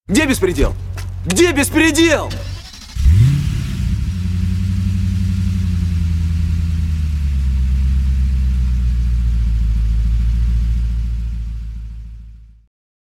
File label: sms